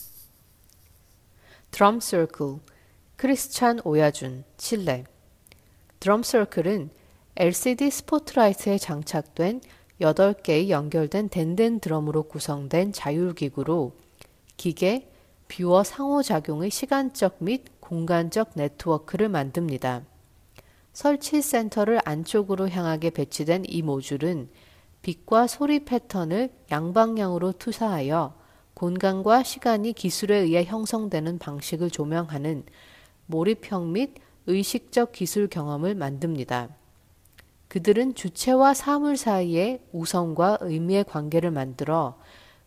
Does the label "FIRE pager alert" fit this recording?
No